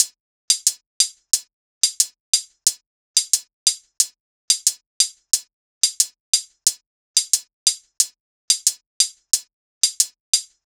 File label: hihat loop 90 dirty